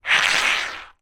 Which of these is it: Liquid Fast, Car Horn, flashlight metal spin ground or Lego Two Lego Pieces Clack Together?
flashlight metal spin ground